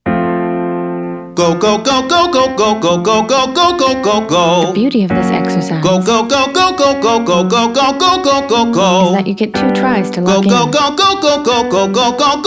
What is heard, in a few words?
Exercise go go male; Singing